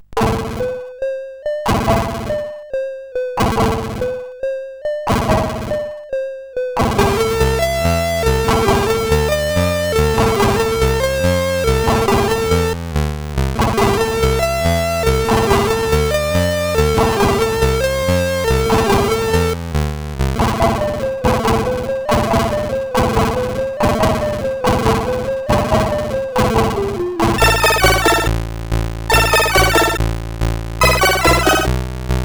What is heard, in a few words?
sid 8580